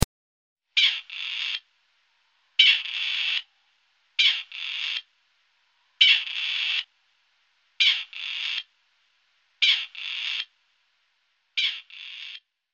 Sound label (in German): Rebhuhn Rufe